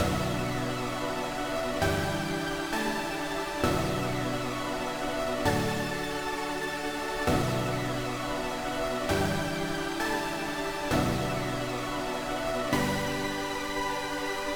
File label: ambient synth; 130BPM; stems; Pony Fan Music Remix Stems